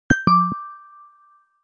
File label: Text Message Ringtone